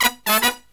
Killer Brass Riffs, 108 soul

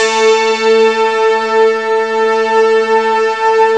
PPG WAVE, OLD SYNTHS

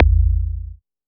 Voyager Perc, Moog Voyager

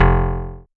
Bass, Synths, 5U Modular

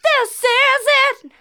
Female vocals and robo-vox

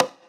Conga; Equipped Music; Loungin House

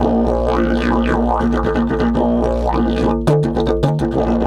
108, DIDGE 108BPM

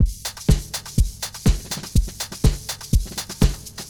123, loops drums; Blimey; RoBKTA Sample Pack Supreme

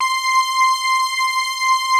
JUNO PADS; Pro-Rec - Synthsonic; JV PADS

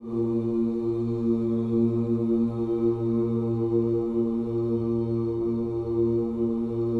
OOS A#2, Gospel; ILIO; Vocal Planet

